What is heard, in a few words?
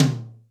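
dry tom, dry kits; Crypton - DrumBase5000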